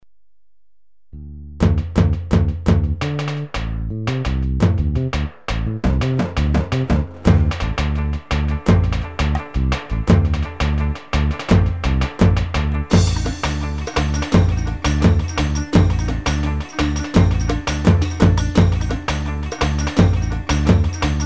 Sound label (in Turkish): bursa ciftetelli, ketron ritim